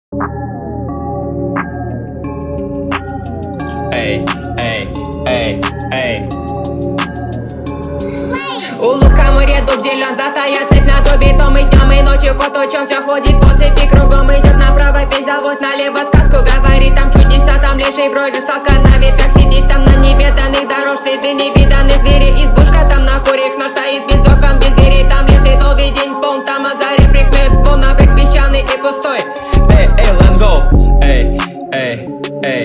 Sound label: trap rap style